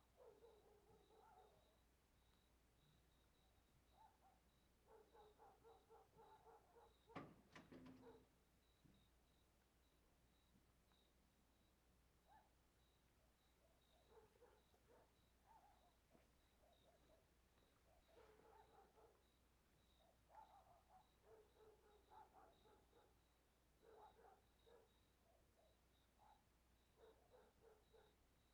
Ambiencia frente da igreja a noite com grilos e cachorros longe; ambiencia rural

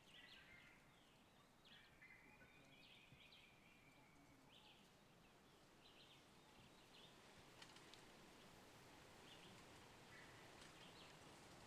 Ambiente na mata com vento com folhagem e passaros longes

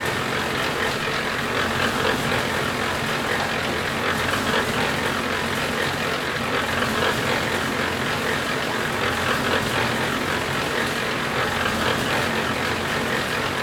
Betoneira obra depois se distancia, batidas e poucas pessoas no final; maquinas; canais-stereo

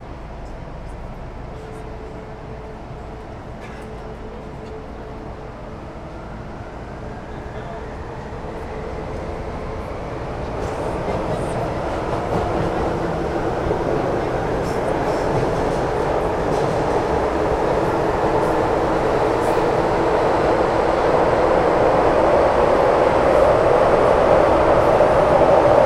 Metro de brasilia dentro do vagao subsolo chegando na estacao 110 sul; com pessoas

